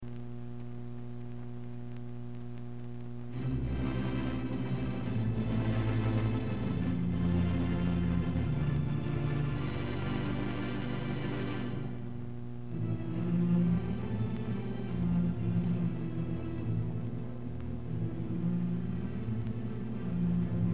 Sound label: Theater Organ in Hi-Fi